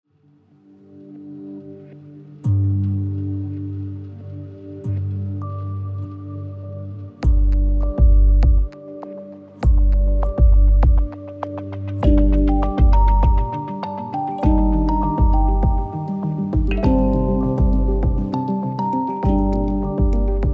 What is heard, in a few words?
pandrum